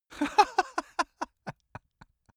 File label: male laugh bright